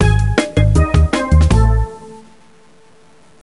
Jungle Loose; Magnetic Joe; DSiWare; NDS

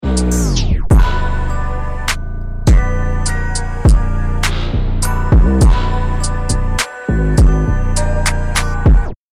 bomb ten sec count

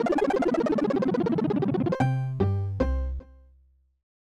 Lose Life

